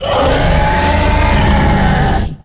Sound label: ROAR; kabuto; giants